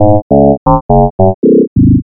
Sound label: alien signal